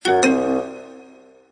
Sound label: fail; Toon Tanks; Wii U